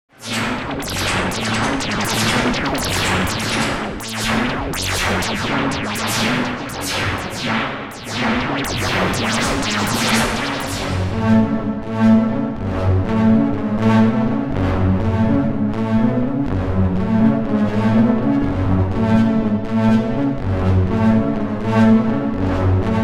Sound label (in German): Sequenz mit Effekt